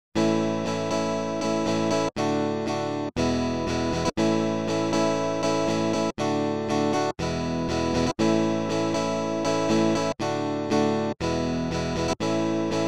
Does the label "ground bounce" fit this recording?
No